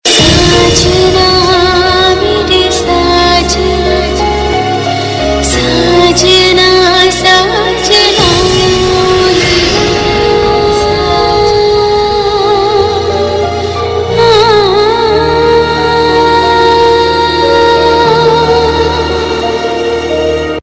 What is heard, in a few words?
Sad Female Version; TV Serial Tone; Colors TV; Shastri Sisters